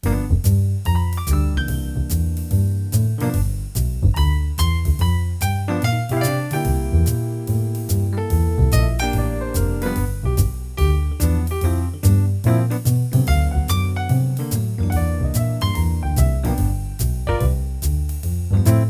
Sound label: Jazz Simple, orchestrated jingles